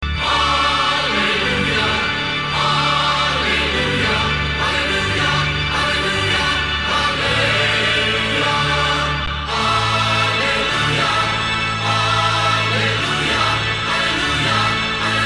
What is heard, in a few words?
Hallelujah Chorus